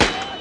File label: woodwrk